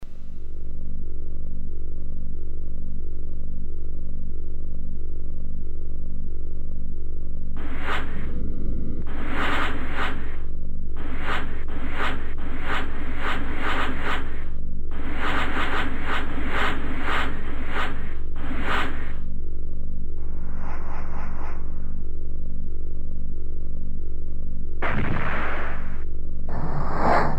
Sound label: 3DO jingle; 44.1khz stereo 16bit